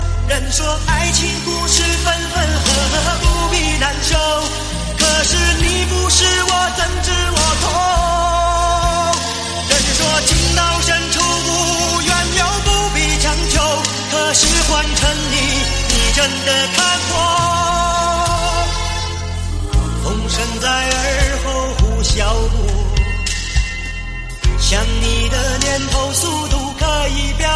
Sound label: chinese song ringtone